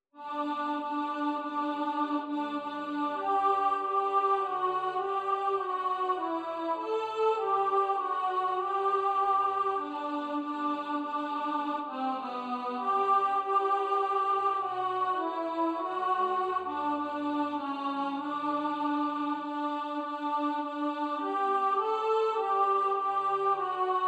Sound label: Hark The Herald Angels Sing Alto